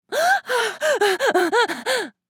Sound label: Woman moaning in pleasure